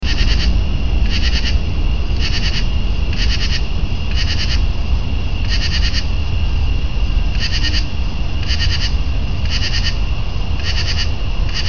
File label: katydid